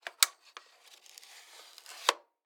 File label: X-Ray Machine; Medical; Blastwave